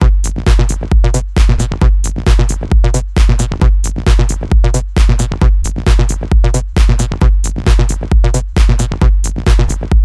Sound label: Locked Groove